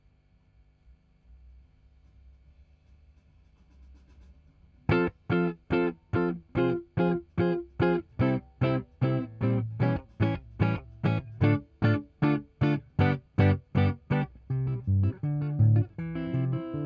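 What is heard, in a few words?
Guitar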